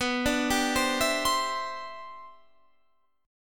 G 6th Add 11th B, chord; G6add11/B arpeggiated

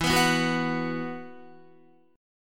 E Suspended 2nd E, down, Guitar; Esus2; strummed

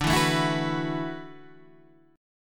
E 7th Suspended 2nd Suspended 4th, D

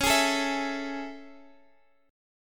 A Major 7th C sharp

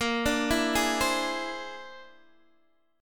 A sharp 9th Flat 5th A sharp, Guitar, Open D

